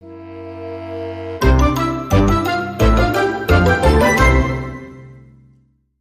Electric Drums

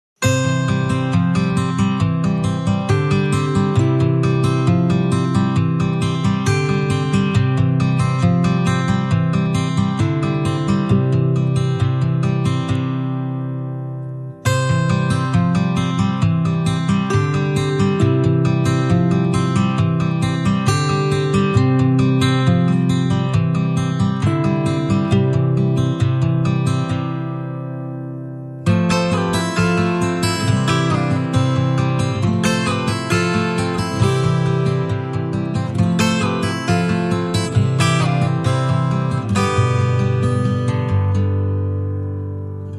Acoustic Guitars Only

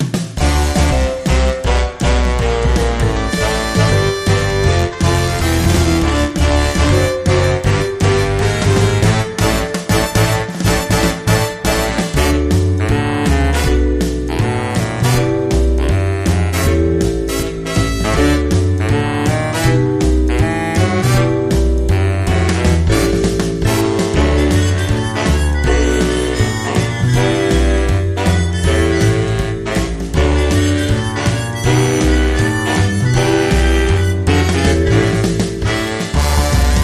Big Bad Brass

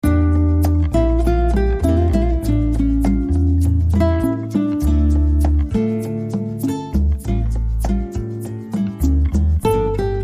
Latin Ballad

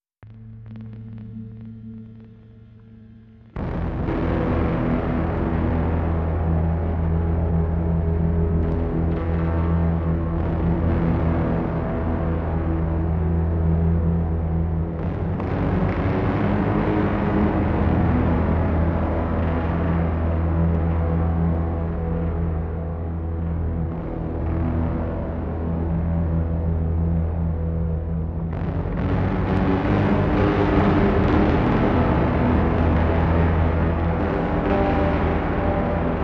Distorted Bass; Phosphine

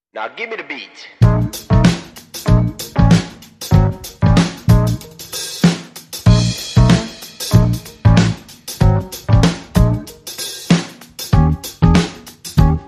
Sneaky Funk

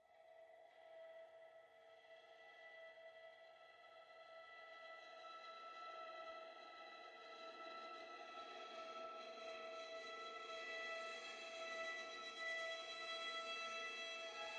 Strings

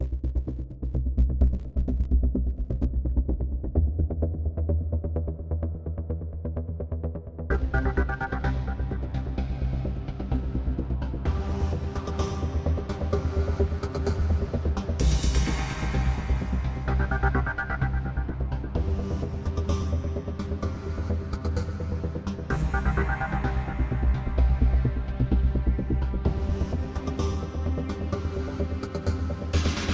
Quest Drone

